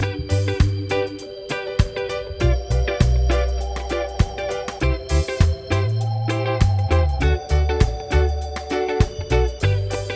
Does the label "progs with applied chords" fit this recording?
Yes